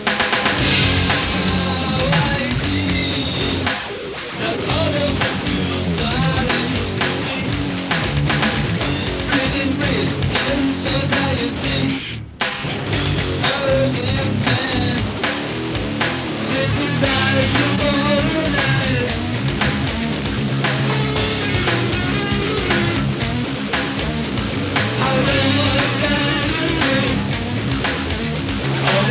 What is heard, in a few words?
Vocal Synthesize vox bass